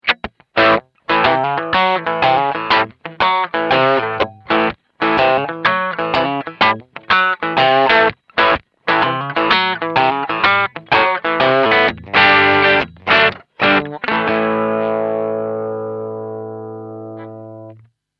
Amp pur

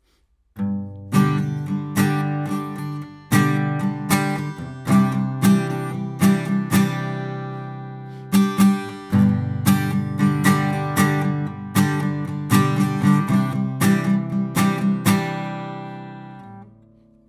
Gitarre; Neumann TLM102

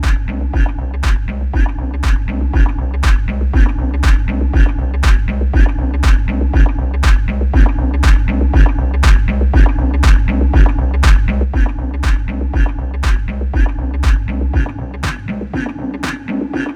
Pioneer Toraiz SP 16 Drive u. High Pass Filter u. Drive

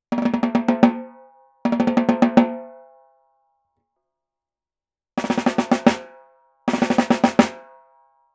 CC 57 Kondensator Mikrofon Pearl Snare 55x14 5cm Abstand; the t bone

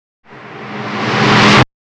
Snare bearbeitet

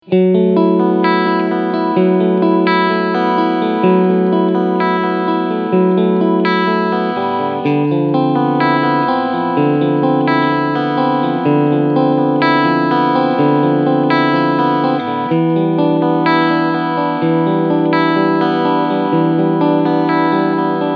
S7 Vintage 3TS Cleansound Steg Mitte Pickup; Larry Carlton

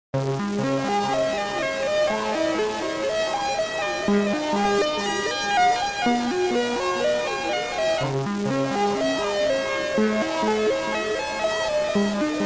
Sequenz

